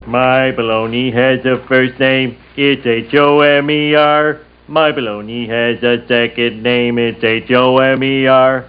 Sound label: singing